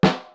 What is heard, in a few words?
snare, Millenium MPS-400